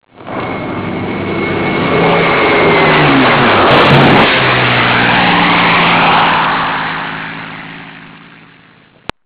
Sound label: flyby